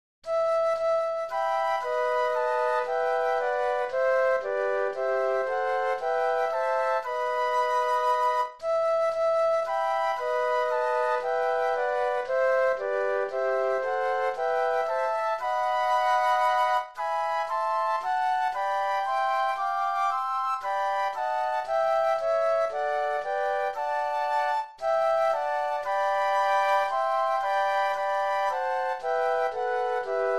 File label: Flute Trio; christmas